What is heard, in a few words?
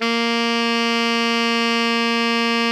ALTO FF A#2, saxophones; Greytsounds; Philip Wolfe David Crozier's Saxophones